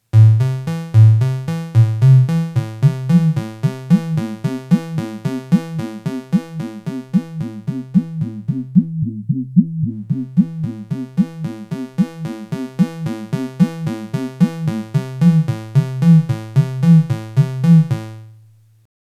Spatial Delivery V3 Synth Envelope Filter Sweep LP; Earthquaker Devices